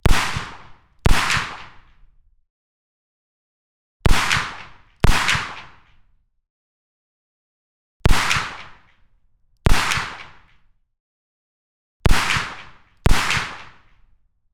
Smith & Wesson M29 .44 Magnum 2m behind right clean Double shots; PZM